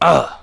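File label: dying; nwnds audio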